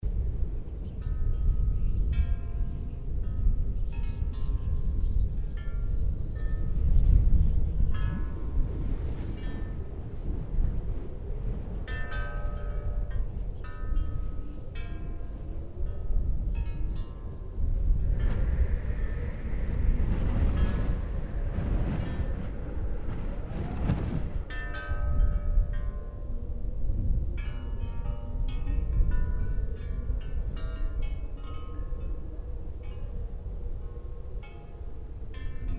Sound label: wind loop; starwars; the force awakens